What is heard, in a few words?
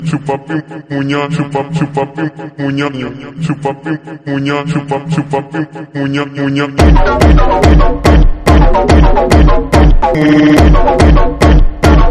phonk drop